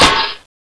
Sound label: garg claw